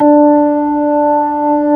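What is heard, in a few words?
ORG B3 D 4, organ; Best Service Gigapack 2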